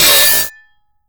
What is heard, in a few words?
manhack grind; npc; Half Life Alyx